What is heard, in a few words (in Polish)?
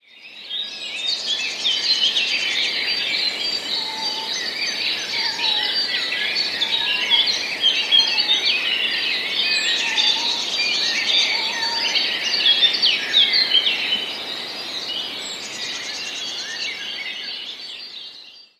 sojka; sójka cicho pohukuje, trochę jak puszczyk